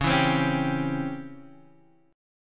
C sharp Minor Major 7th Flat 5th Guitar Half Step Down